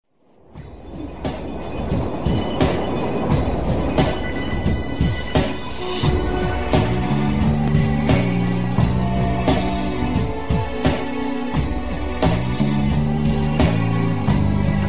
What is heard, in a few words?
chant; boondock saints